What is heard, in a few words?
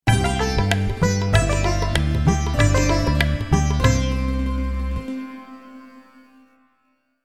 nokia, ringtones